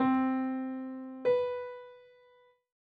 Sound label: B7 mel